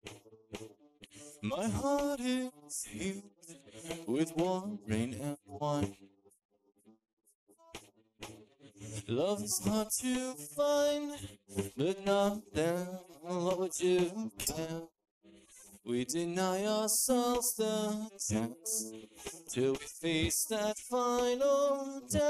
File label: vocals; SiSEC11